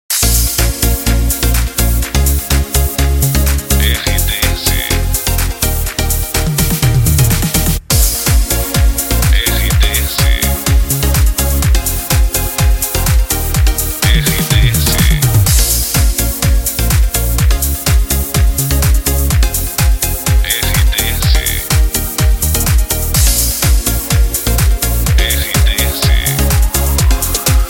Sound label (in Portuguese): trilha para locução; Pacote Maximus FM de Rio Paranaíba/MG. Emissora com perfil Jovem